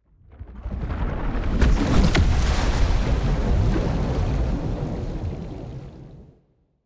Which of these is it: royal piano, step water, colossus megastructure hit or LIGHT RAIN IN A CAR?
colossus megastructure hit